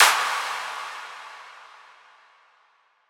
Impact Clapping; One-Shots; SFX; Ghosthack; Color Bass Essentials

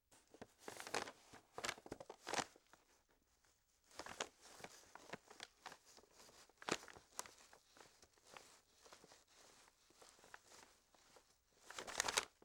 Paper Bag Movement; Fairlight Sound Lib

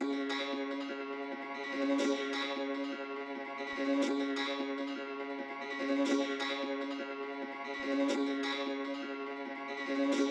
118 BPM C# Min Dulcimer; Melody; Trap; Loop Stems; Cymatics; 2022 Melody Collection - Trap Pt 2; Last Fight

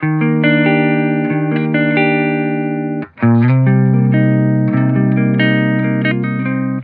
Hip Hop Guitar Loop 140 BPM D# Maj; Cymatics; Vibrations Guitar Sample Pack

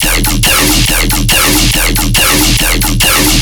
Bass Loop E 140BPM, Dubstep

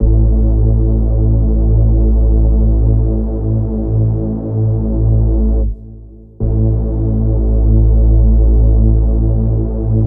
Synth Bass Am 150, Trap Kits; Melody Kits; Loops; Ghosthack; HipHop

